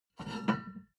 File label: plate on plate take soft, restaurant cafe